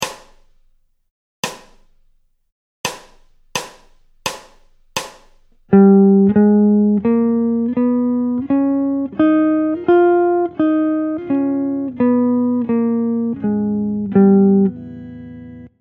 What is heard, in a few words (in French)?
G altere, guitare jazz